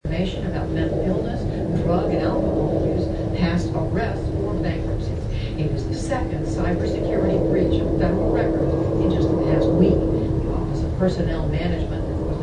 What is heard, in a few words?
Aircraft Noise Samples